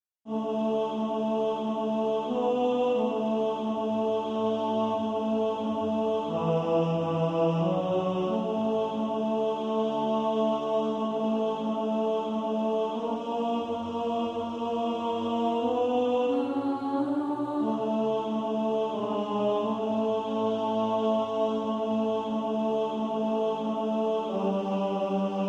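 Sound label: tenor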